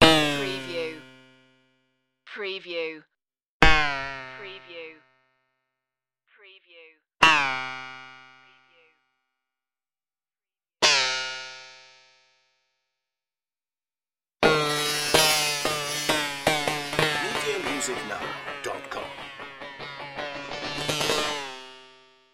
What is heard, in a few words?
crazy magic springs